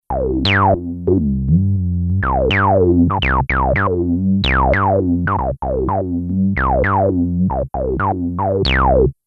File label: Acid Bass